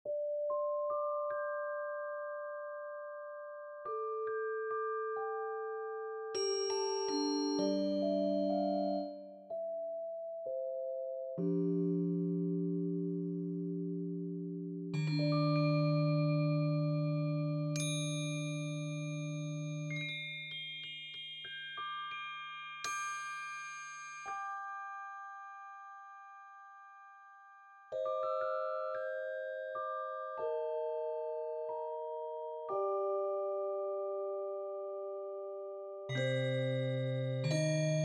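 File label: celestal crystal, Pianoteq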